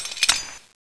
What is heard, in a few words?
keys